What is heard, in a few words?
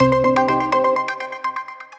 free spin end; Fire Queen